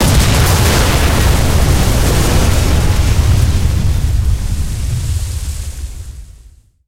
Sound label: explosion naval